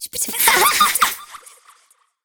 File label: Girl Idle B; Warframe; Zariman; Skitter Girl